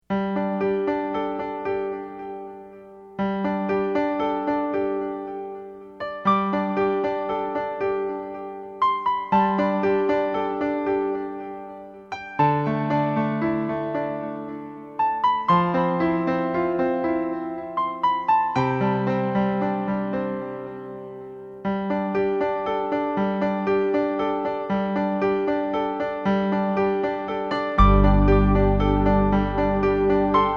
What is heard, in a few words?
Waltz